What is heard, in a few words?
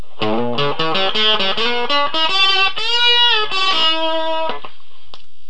Bluesy; BearBoostPlus